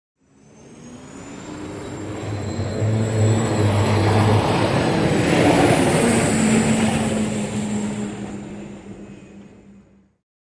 Hydroplane By Fast

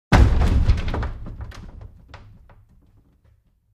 Large Chest Dropped

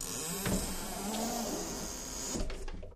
Door Bi Fold Open

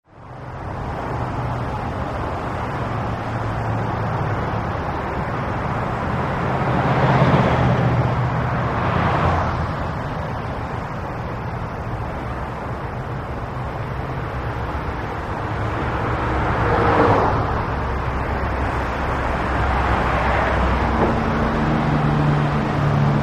Intense Calif Freewa, big city

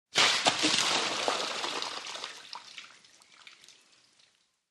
Bucket Water Slop

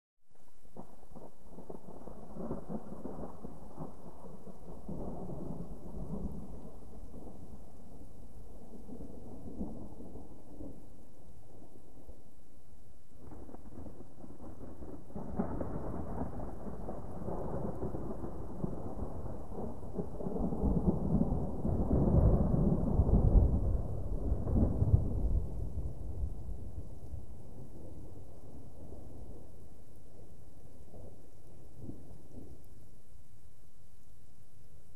Thunder; Gentle Crackling Thunders

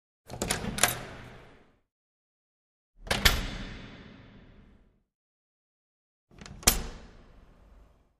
Door Metal Armored Open

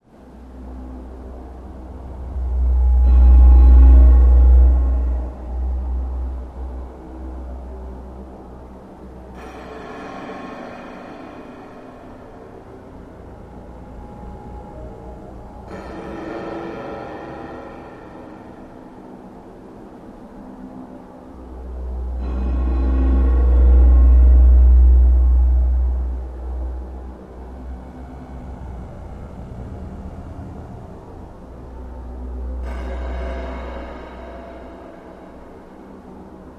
Static Low Deep Impacts

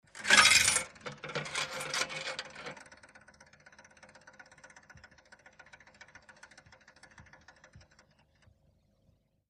Brit Call Box Push But, foreign pay phones coins

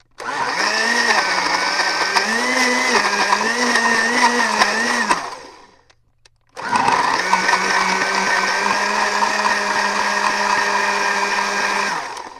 Blender Full Mush Jo

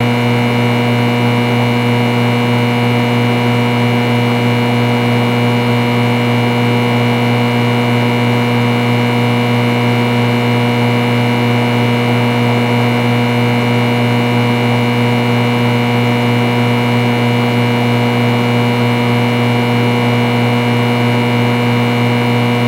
Light Transformer H, buzz & hums

